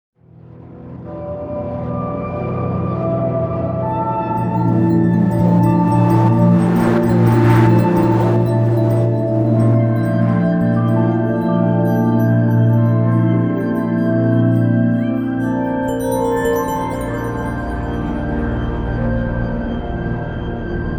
Defeat; legend of legacy

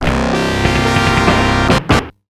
Game Start; Riot City

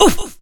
Oof

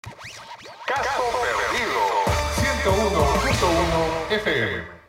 Radio Car Latino Jingle; gangstar rio city of saints

